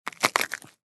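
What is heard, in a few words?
sounds of a twisted neck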